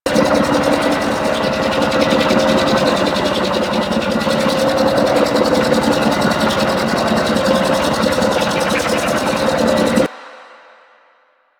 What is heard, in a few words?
Research Pod Engine Loop; JadeShadows; Ascension